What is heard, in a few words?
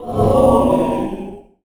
muffle; SPELLS